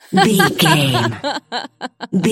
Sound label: voice female laugh